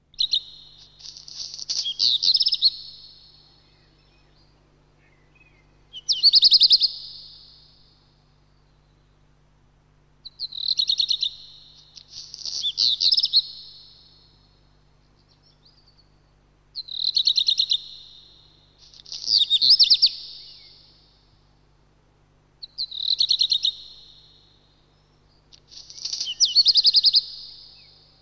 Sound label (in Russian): gorihvostka chernushka golos